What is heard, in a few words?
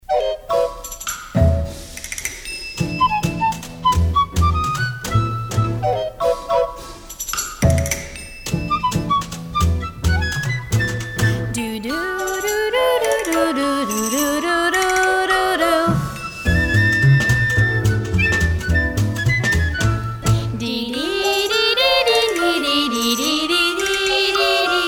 Jingle and Background; Lorin Frank Productions; Gold Medel